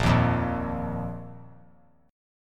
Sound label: A sharp Suspended 4th; strummed; A#sus4; JGuitar